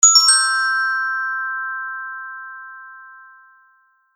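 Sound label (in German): glocken 3 tone, ringeklokke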